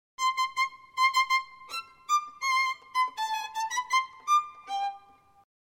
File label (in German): Violine